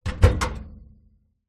household microwave oven door close recorded inside